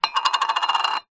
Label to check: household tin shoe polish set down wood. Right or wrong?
Right